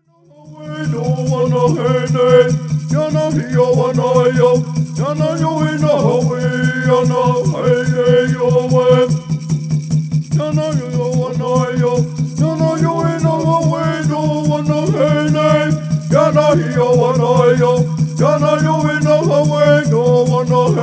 Peyote Healing Song

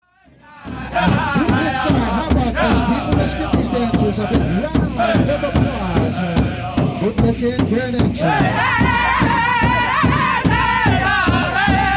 Grand Entry Drum Song

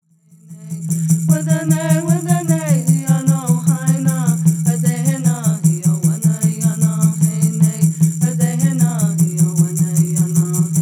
Peyote Song

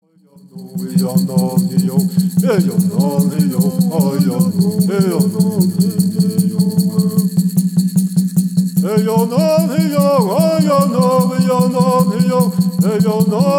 Straight Song

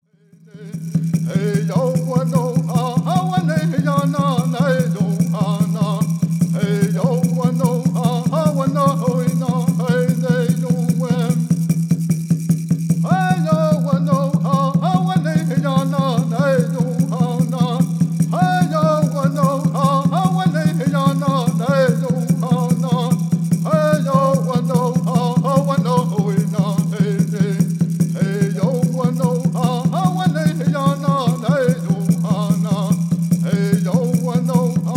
Peyote Song

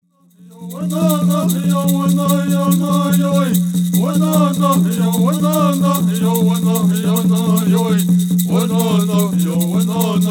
Peyote Song

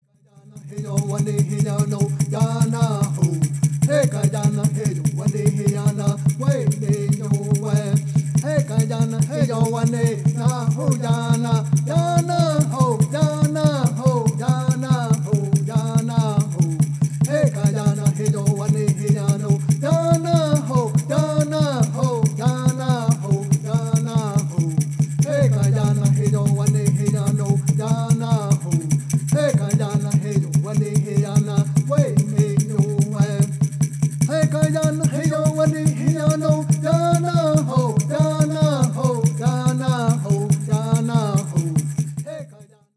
Peyote Song

